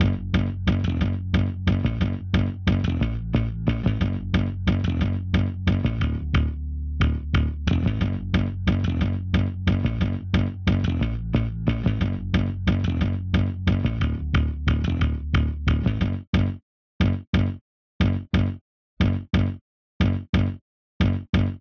Pre Start Music; Kolbeinn

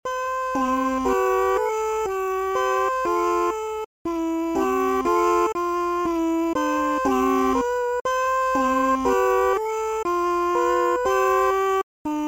Vox; Mogwai Song 6; Gremlins Gizmo; wii

